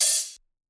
Hi Hat Closing Hit

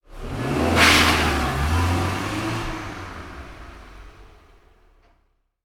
Fast Car Starting On Wet Road

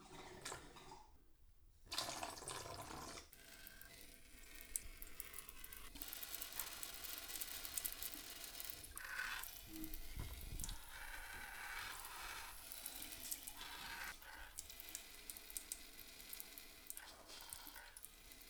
Going to floor

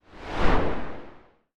Swoosh

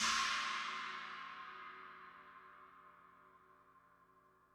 China Oriental Trash Low Vol

